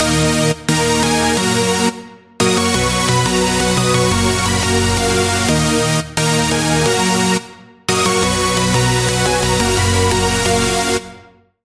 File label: Lead; Routed Sound; Sylenth1 Expansion - Flame Summoner Vol.1